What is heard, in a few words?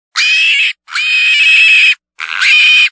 Young Domestic Pig